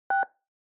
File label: Telephone DTMF, 3